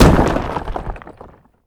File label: wall rocks; Golden Crayon Sounds